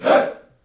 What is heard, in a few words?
HEY, SHOUT; Spectrasonics; Heart of Africa 1; CH. SHOUT1